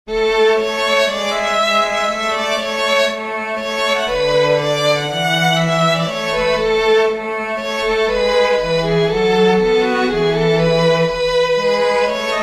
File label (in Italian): in chiave di violino; Doppo ch'ogni mistier